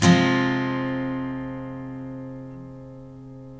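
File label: steel F minor up, CHORDS STEEL, Guitars; Spectrasonics; Hans Zimmer Guitars VOL-1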